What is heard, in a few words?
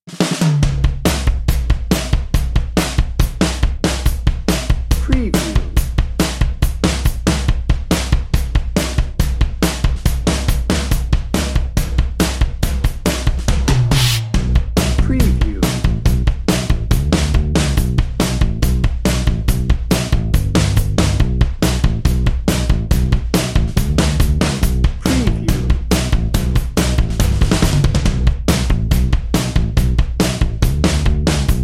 night work dnb